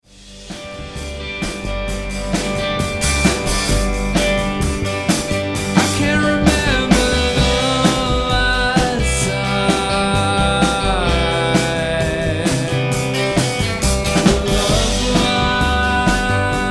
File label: rock